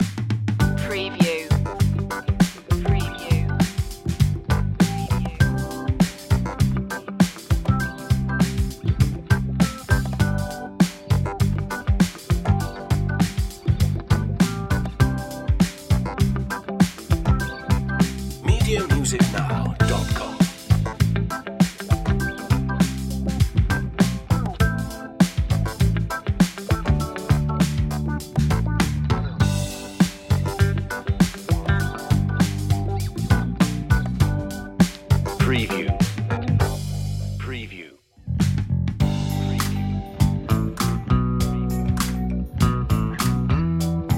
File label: easy funk comedy, Retro 70's Easy Funk Comedy Loop, A carefree and light funky track